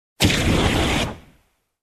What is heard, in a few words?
Flamethrower Blast